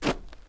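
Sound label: shuffle, footsteps, hard surface, jump up; dirt; elite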